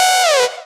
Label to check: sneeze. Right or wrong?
Wrong